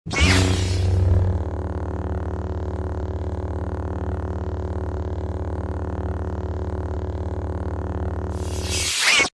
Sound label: Lightsaber; Count Dooku